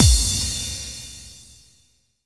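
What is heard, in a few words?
bass drum FX, dance drums; Best Service Dance Mega Drums; BD-FX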